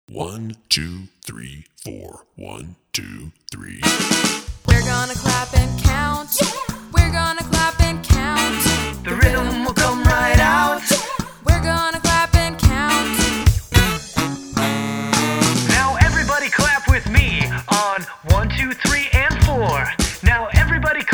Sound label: Clap Count